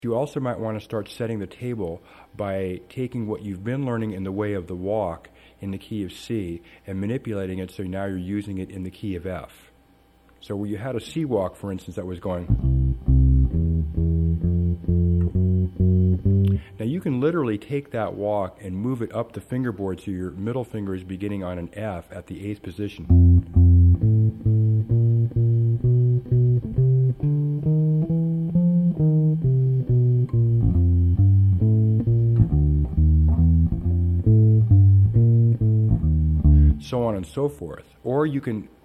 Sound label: walking bass